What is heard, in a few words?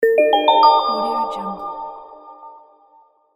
app welcome